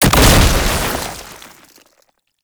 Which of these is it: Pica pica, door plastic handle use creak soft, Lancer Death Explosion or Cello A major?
Lancer Death Explosion